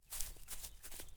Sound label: walk forward